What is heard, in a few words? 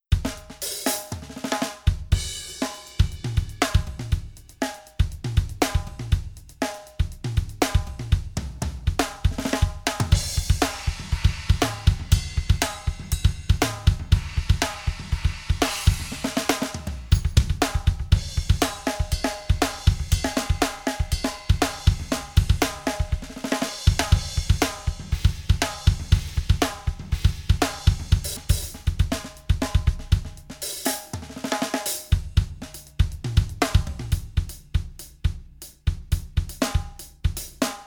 Drum Programming